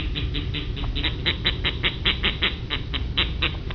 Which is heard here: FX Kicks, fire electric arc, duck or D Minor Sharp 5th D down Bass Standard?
duck